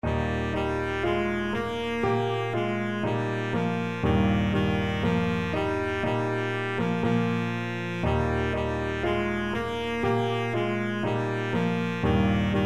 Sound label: baritone saxophone